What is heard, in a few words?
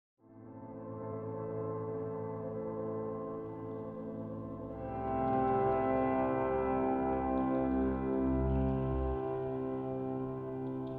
Piece for Processed Bottle and Piano; salty grain on